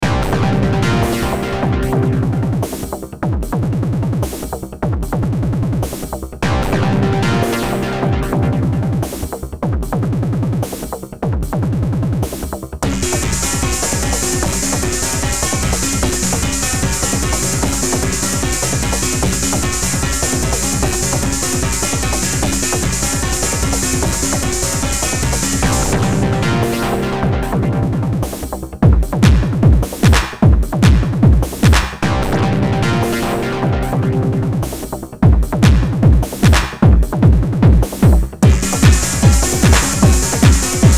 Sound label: Beat Techno Dark Driving Synths; Miscellaneous Loops